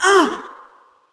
Wound Light, Creature; Eydis

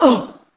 Wound Medium, Creature; Eydis

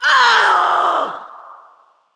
Wound Heavy, Creature; Fjola

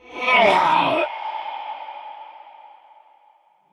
Wound Crit; King Terenas

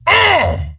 Wound; Kologarn

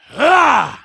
troll attack; Halazzi

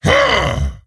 Attack; Kologarn

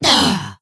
Wound; Tenebron

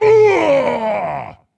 Wound Crit; Captain Rupert

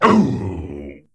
Troll Wound; Trollgore

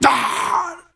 Wound Heavy; Varimathras

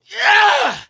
Attack; Keleseth

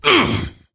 Attack Light; Varimathras

